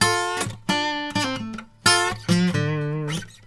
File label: Blues Guitar; ILIO; Fretworks; LICK